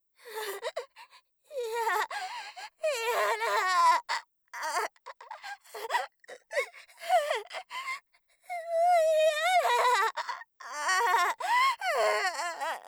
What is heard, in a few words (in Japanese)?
限界; 効果音なし